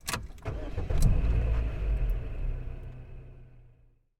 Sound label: Engine Start Up